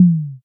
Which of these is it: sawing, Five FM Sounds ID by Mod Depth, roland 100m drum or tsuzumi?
tsuzumi